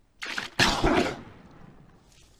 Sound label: death, road creature; trevor henderson